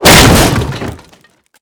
Projectile Sounds; Red Orchestra Ostfront 41-45

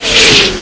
artillery incoming short

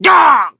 pain

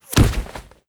cloth bullet impact; Rust

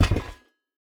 bed deploy; Rust

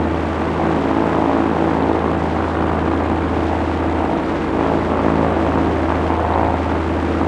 plane; World of Tanks

